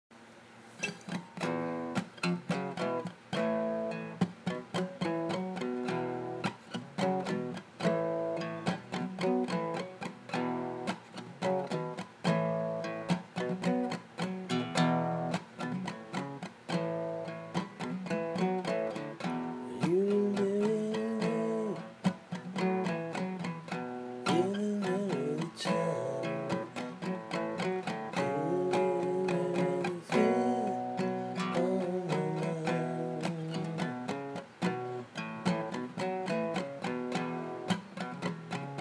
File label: Guitar Idea